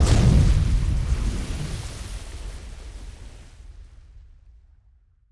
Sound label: water splash distant; destructibles